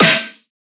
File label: snare monster; one shots; SEISMIC Drum Sample Pack; Slate